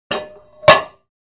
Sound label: Free Weights Exerci